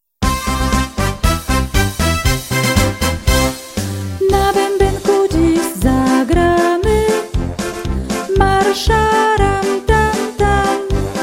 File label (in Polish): marsz